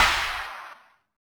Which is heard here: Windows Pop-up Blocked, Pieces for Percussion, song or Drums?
Drums